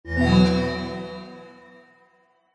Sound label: game sound tone magical